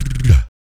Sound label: VOC PERC, BASS PERC; ILIO; Vocal Planet VOL-3; Jazz & FX